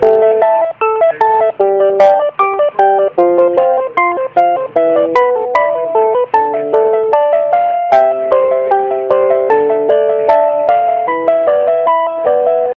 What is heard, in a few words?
Vtech Ringtone